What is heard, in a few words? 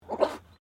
Animal Alpaca Male Snort; Alpacas on a farm in Gippsland Victoria